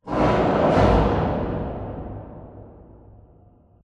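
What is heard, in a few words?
Assembly Wall Rail Distant; Titanfall 2; Boomtown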